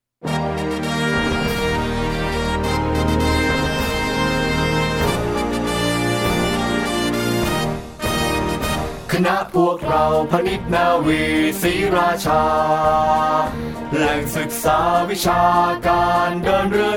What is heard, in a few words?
orchestra; เพลงประจำคณะพานิชยนาวีนานาชาติ